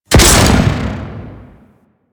Drop Ship Explosion; Ambience; Warframe; Infested; Golem Trial

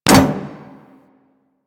Ship Hit Effects

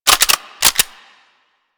Lever Action Rifle Reload, Weapons; Warframe; Tenno

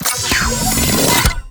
Sniper Rifle Alt Charge; Warframe; Weapons; Corpus

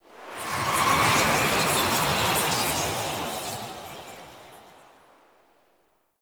Day Wind Gust Calm; Glades Of Andurin

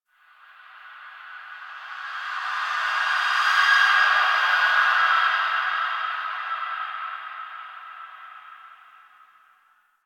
Memory Echoes

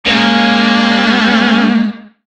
Shawzin Phrygian Chord L; Music; DE; Warframe; Lizzie Shawzin

